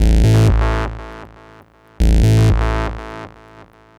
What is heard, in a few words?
Synth Ultra Bass